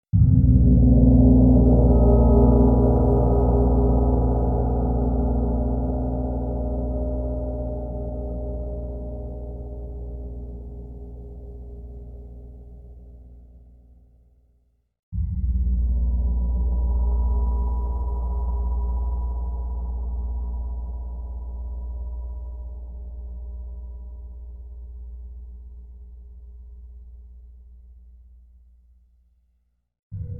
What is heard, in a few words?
Gong Thunder Water